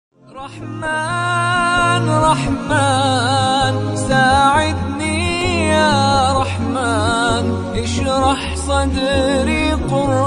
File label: islamic ringtone